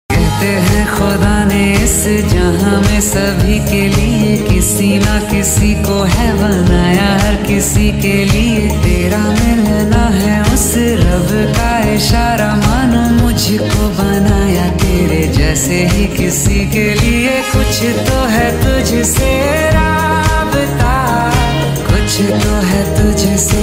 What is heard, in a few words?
unplugged cover, ringtone